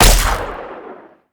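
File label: weapon pistol laser fire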